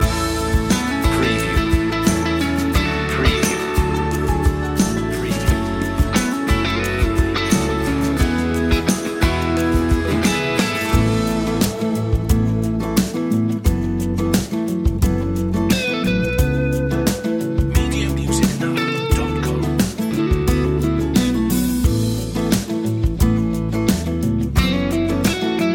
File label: good harmonies loop